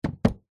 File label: foley wooden box case set down gently hard surface; zapsplat